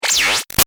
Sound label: Shot Laser Coarse Quick; Lasers; Sound Design